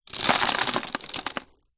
glass pieces drop sprinkle onto thousands more small broken plastic tub; foley; zapsplat